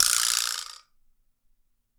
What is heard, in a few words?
VIBRASLAP; PlatinumPhattCD2; E-MU Producer Series Vol. 8 – Platinum Phatt; Flexis Etc